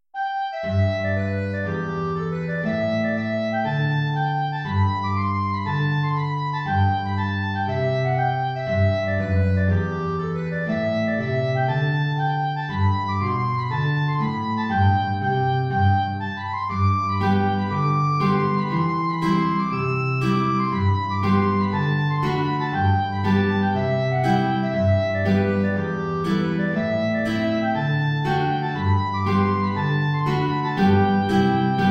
Hornpipe Klarinette in Bb Gitarre hoch; The Belfast Hornpipe

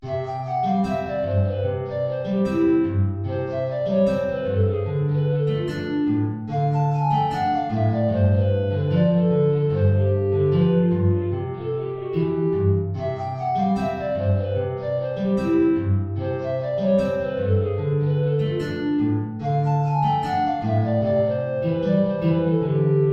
Tenorflöte & Gitarre

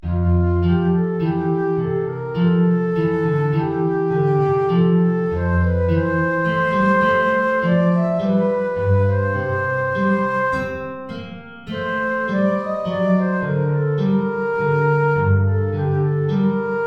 Querflöte & Gitarre; Warum sollt ich mich denn grämen

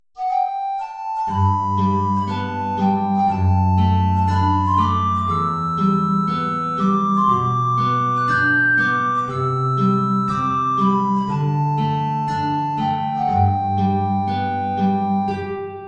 Sopranflöte & Gitarre; Down by the Salley Gardens

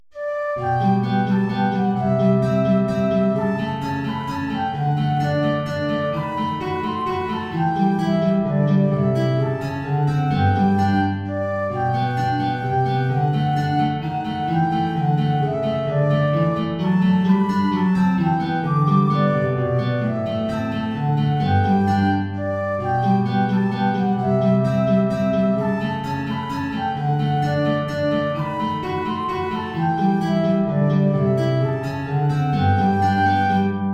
Querflöte & Gitarre; We Wish You a Merry Christmas

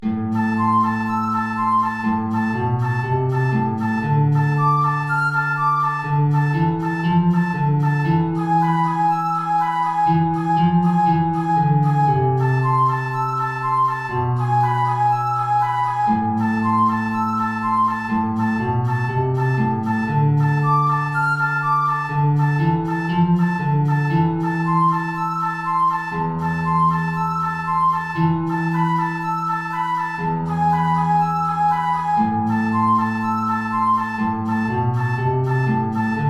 Allegro (Querflöte & Gitarre) (hoch)

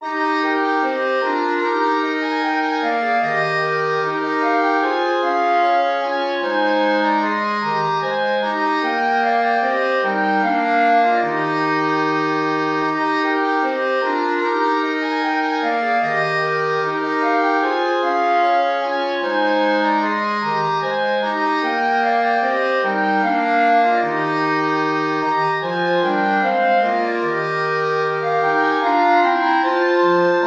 Ballet II (4 Klarinetten in Bb)

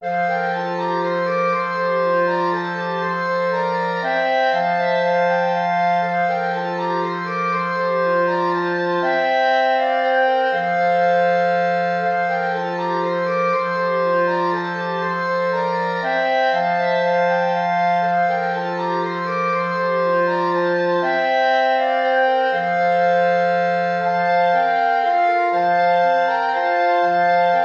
4 Klarinetten in Bb; Saltarello

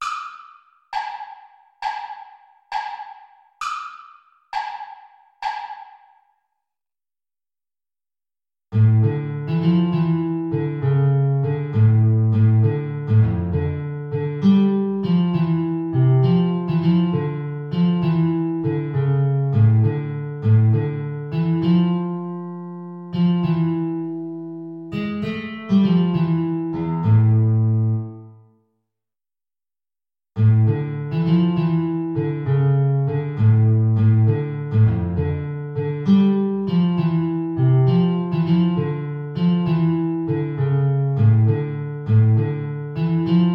Siciliana (Querflöte & Gitarre) (Play-along); Opus 4-1-1

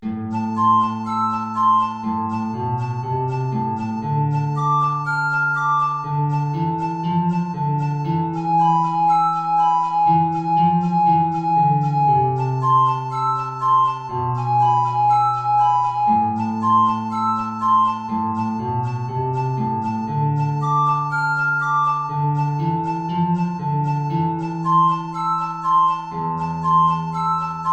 Allegro (Altflöte & Gitarre); hohe Notierung

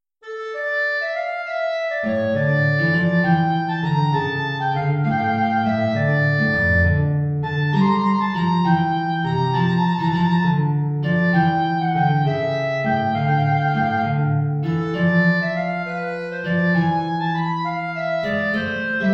Siciliana (Klarinette in Bb & Gitarre) (hoch); Opus 4-1-1

